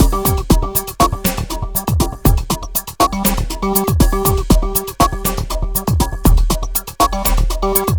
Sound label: Elektro, Processed Percussion